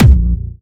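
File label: BD Sub, bass drum; Takebeat; Club; VEC1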